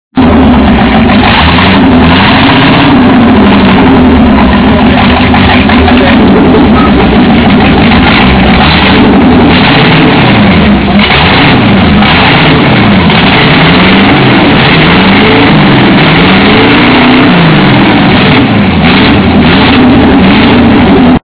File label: start, v8 micra; magicspanner